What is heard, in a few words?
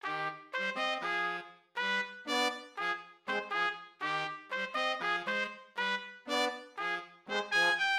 latin horns trumpet melody 120bpm